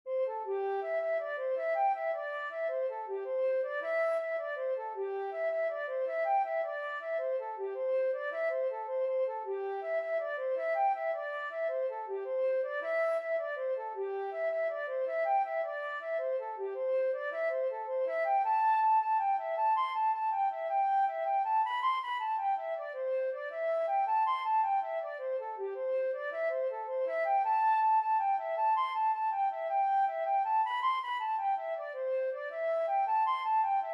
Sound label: on the ocean flute